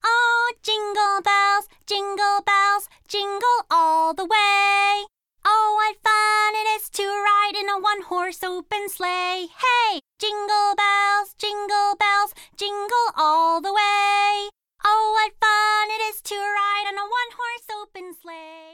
영어 여 동요

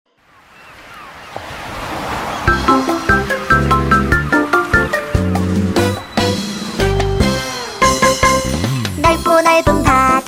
동요 맑고 따뜻한 여아

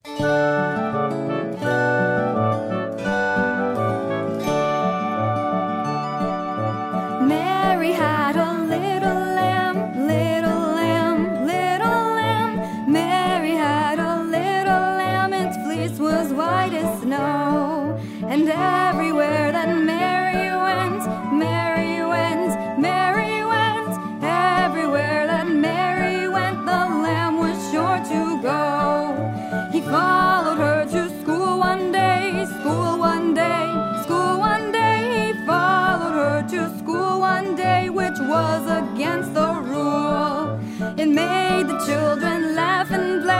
영어여 동요

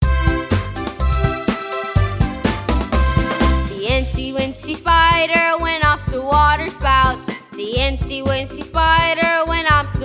영어 여 동요 eng 거미

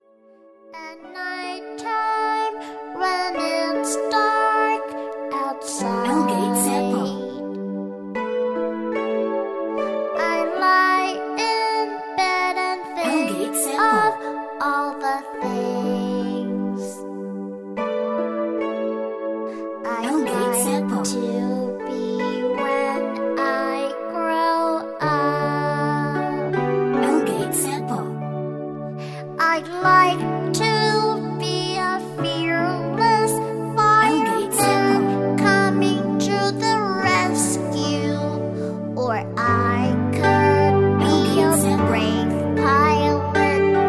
영어 여 Song 남아

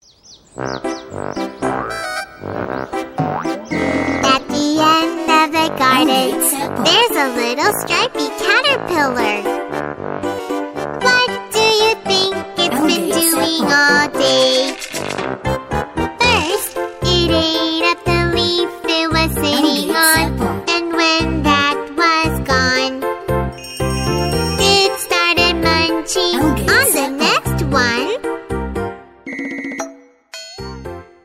영어여 Song Girl

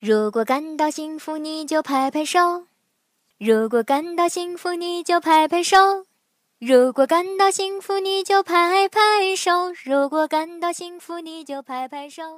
중국여 노래

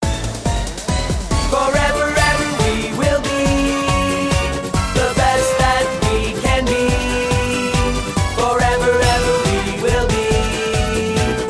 영어남 오프닝 노래; 남자; 포켓몬스터

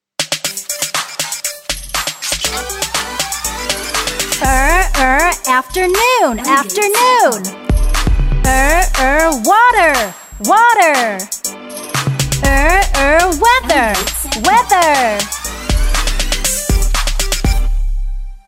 영국여, Song

